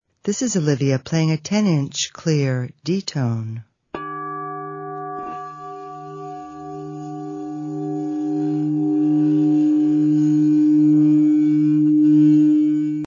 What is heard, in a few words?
d tone